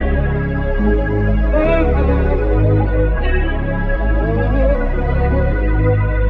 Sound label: keys mmm hmm, Loops